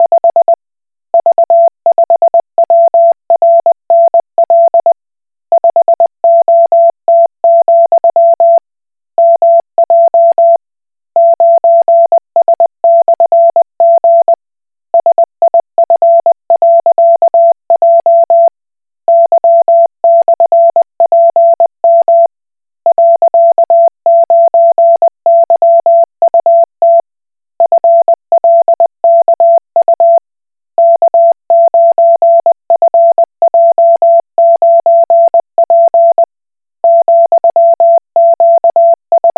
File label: Koch Morse